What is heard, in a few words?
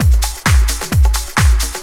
dr t 130 trance 2; Ueberschall; Techno Trance Essentials; DRUMLOOPS